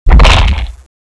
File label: bite; gearbox; renesaur